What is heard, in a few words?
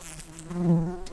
Lapidarius workers, bumblebees